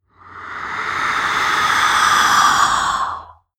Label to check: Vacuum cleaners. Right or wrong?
Wrong